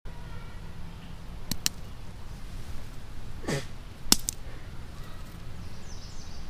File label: Taps and Kid Yelling